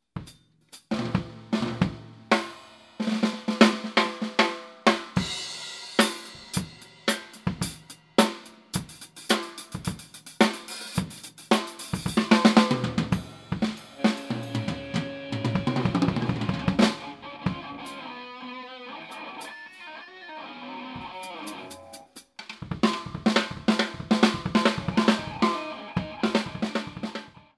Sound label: drum